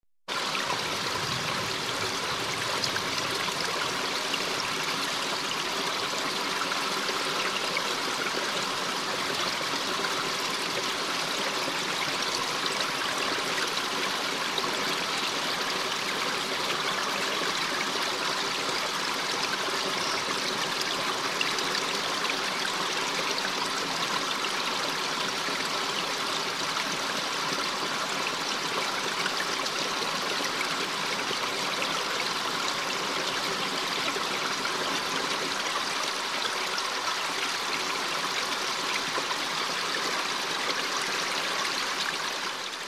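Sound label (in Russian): проточная вода; zvuki vody; zvuki prirody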